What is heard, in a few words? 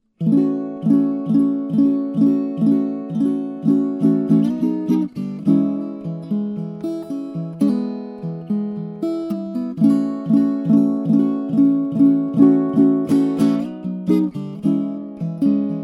uke